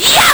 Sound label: LSDj, Nintendo Gameboy; 300 Drum Machines